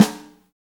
Ambient Acoustic Snare Sound G Key, Royality free snare tuned to the G note. Loud; snare drum samples